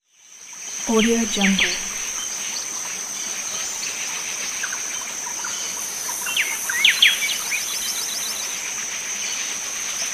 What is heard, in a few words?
jungle